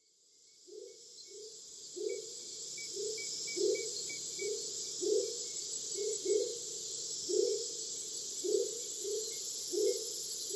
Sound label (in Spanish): paisaje noche gandoca; Gandoca-Manzanillo; historia natural